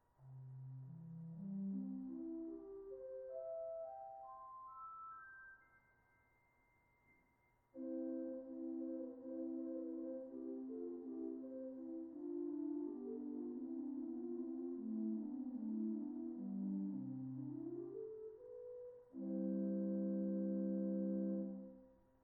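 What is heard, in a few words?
choir hohl flute, organ; 8’ Hohl Flute; Adlung describes it as an open flute of wide scale and hollow tone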